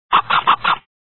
chicken

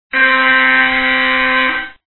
alarm

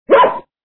bark

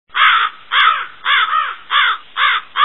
crows

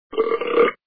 burp

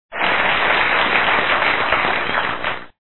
clap

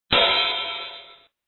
cymbal

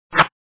swip

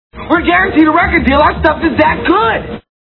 boogie

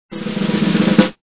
drumroll

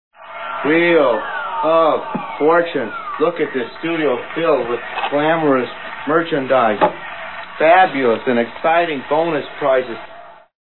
wheel, rain

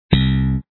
bass

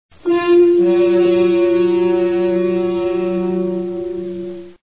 music

